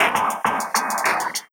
Bumpers; Sonic Foundry (Sony Creative Software); Esoterik Beatz; Spitter Fx

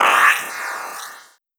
Metal

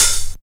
HIHAT; HIHAT ONE; Q Up Arts; Dream Experience; DRUMS PERC

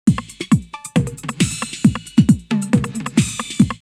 drumloops, Techno House, Nine-O-Nine 136; X-Static Goldmine

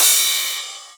Rides, Cymbals

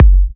F#, Kicks; markus hakala

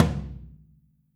FLR TOM OH; Q Up Arts; Denny Jaeger Private Collection

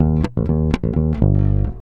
REAL BASS, BASSLICKS; Ueberschall; Jam Box

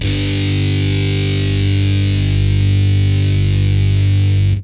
POW CD FUZZ, Guitar; Quantum Leap

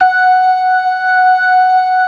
ORGAN, LOWER MANUAL; Keith Emerson Signature Series

